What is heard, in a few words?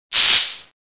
SPARK